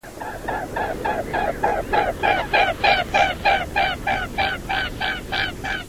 Cygnus olor song

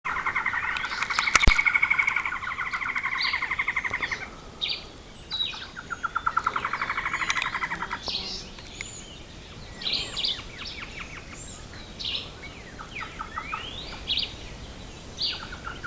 Black-headed Trogon

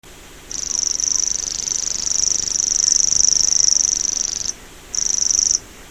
Locustella naevia song

